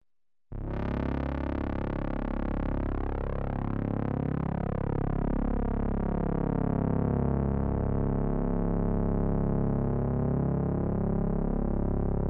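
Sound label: synthesizer deadly melody hard bass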